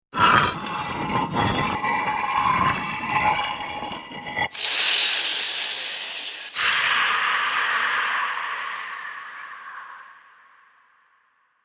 Waking Vampire, Vampire opening stone tomb and waking with creepy hiss.; Monsters; Horror